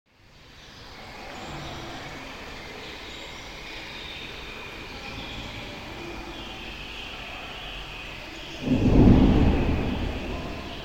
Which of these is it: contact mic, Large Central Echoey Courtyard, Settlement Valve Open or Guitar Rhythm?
Large Central Echoey Courtyard